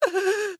AHurt

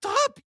AHurt; Morty

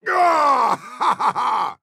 Attack Extreme

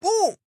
AHurt

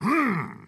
Attack Large; Batman

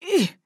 AHurt

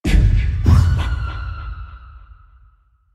Scream

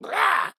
Hurt

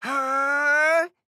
Charge

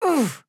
AHurt

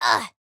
AHurt

